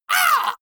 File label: monster hit high; schreie